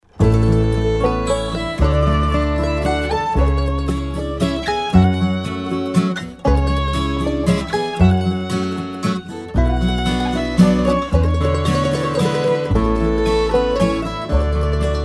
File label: Waltz, Bluegrass